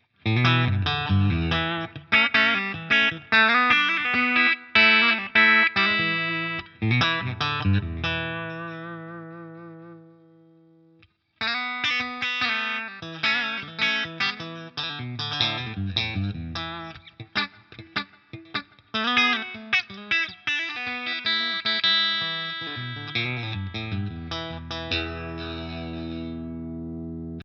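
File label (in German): Reverend Trickshot Steg clean, E-Gitarre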